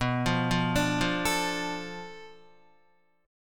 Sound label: B Minor 7th Flat 5th B Guitar, chord; arpeggiated